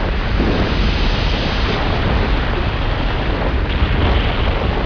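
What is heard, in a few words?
ambience avalanche loop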